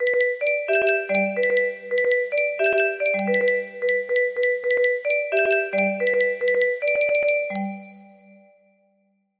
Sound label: music box, music box melody